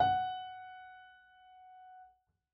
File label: piano f#; Golden Crayon Sounds